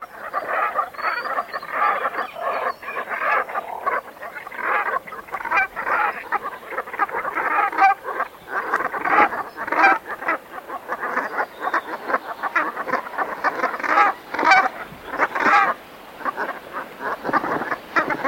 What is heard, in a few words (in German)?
Ringelgans